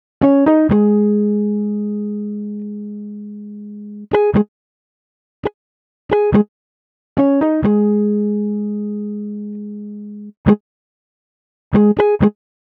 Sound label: Guitar, Cinematic Funk Urban; Sonic Foundry (Sony Creative Software); Cinematic Funk Urban Tone Poems